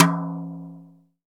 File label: TOM, Drum 1 Dry; East Collexion